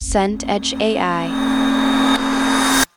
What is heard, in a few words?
transition riser long